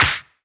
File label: Snares & Claps; Vengeance Samples